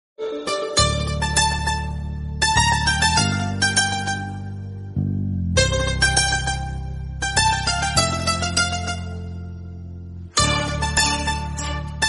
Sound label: bgm kannada; chinni